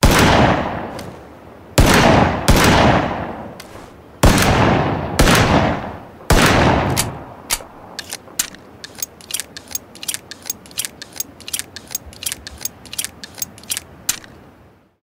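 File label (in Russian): русский револьвер M1895 наган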